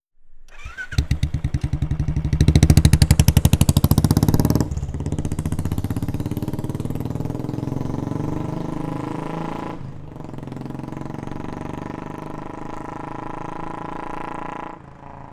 Motorcycle - Royal Enfield 350 Cc - Electric Start - Idle - Fast Speed Pull Away; The AudioVille; Motorcycles of India